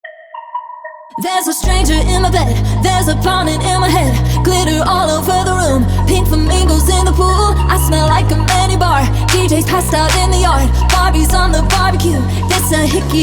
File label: Techno Remix